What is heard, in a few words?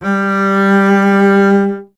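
string contrabass G; Miroslav Vitous; Solo Instruments VOL-2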